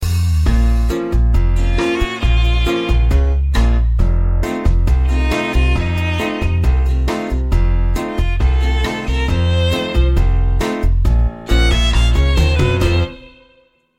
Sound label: violin